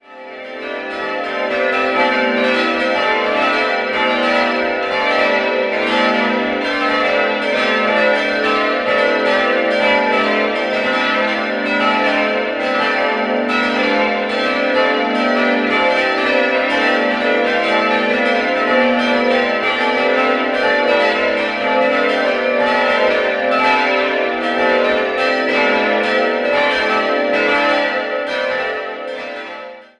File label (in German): Rück-Schippach, Pfarrkirche, glocken; St. Pius; 1959; in Heidelberg gegossen; in Passau; Friedrich Wilhelm Schilling; Rudolf Perner